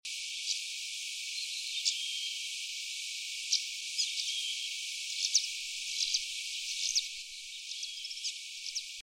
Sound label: black collared swallow